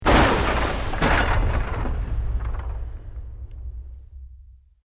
EXPLOSION MEDIUM WOOD

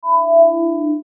Sci Fi Alien Chime Signal Scanner; Aliens

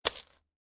Poker Chips Dropping On Poker Chips

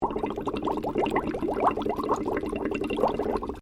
Water Bubbling, Heated water sounds; Nature

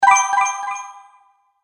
Magic Purchase Delay Ding; Cartoon Sound Design Sound Effects

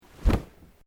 Clothing Kimono Sleeve Flap; Movemement sounds for various articles of clothing; Dressing; Human

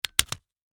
Hole Punch Paper; Office; Hole Punchers; Offices

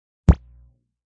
Lazer Sound; Laser Sound

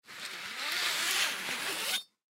Machete Pull From Sheath Slow Long Knife Out; Warfare; Blastwave

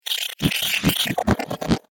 Cyber Spiders, robot sound effect; science fiction sound effect; star wars / trek style games; Andrew Fleming

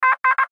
Radio Morse Code; Morse Code Letter G; Morse Code (Sound Effects:Electronics); Blastwave; Warfare

